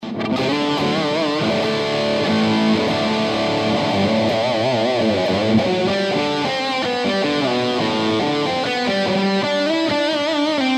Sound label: with eq